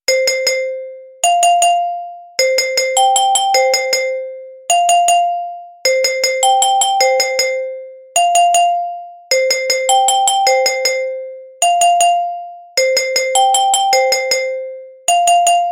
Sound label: Vibraphone